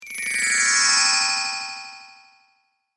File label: Chimes High To Low Fast Delay, Windchime